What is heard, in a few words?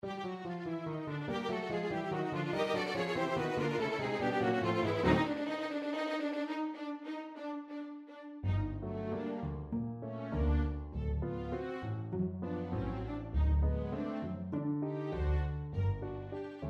Birthday Tango, Everything String